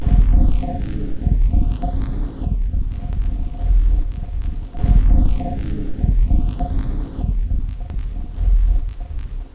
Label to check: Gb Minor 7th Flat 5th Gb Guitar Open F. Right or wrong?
Wrong